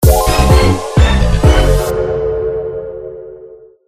failure; tf2ware ultimate; music game

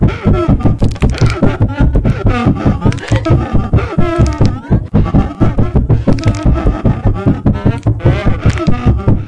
running crying; itsteals; legs; slender

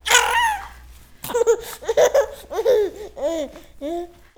baby talk; tm baby; slender

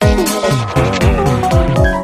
failure; tf2ware ultimate; music game; wii penny

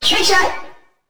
attack; slender; roblox; coolkidd

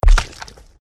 walk, footsteps

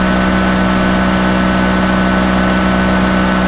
high, vehicles; skyed; nfs heat ganimator pack

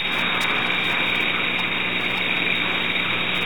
noise loop

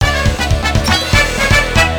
victory; tf2ware ultimate; music game; wii tinywario

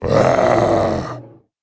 alert, male zombie; nmrih; zerosiki; slender

